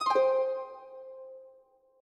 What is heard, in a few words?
progress down